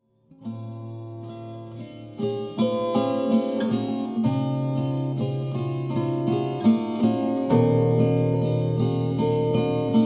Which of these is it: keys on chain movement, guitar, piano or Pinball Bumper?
guitar